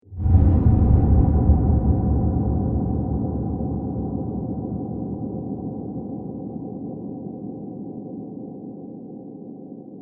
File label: Deep Epic Film Whoosh; Whoosh Sound Effects